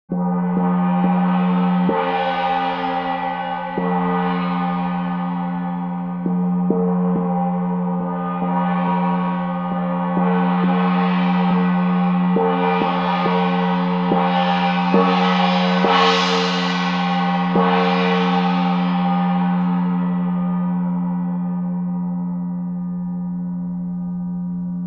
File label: Chau Gong 40